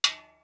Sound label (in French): Timbales fut